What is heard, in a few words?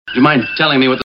oppo a37 ringtone